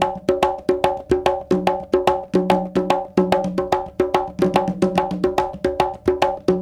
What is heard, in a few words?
Bembe 6/8, World Dance; Spectrasonics; Supreme Beats